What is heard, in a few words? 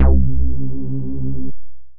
Analog 80 Bass; Ableton; Factory Packs; Synth Essentials